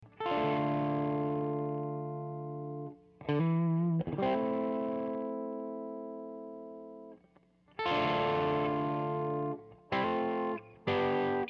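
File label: Guitar Stem Dry Wet; Bob Clearmountain; Apogee plug-in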